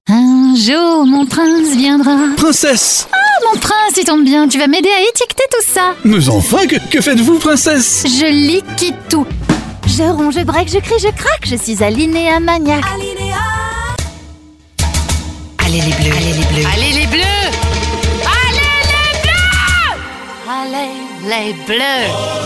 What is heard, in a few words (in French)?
pub chant voix off femme; showreel; voix off chantee